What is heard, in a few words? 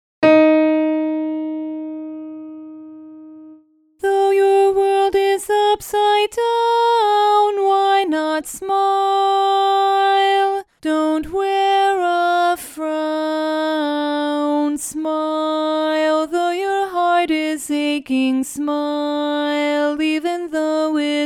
Lead Alone